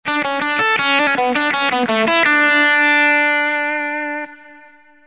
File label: riff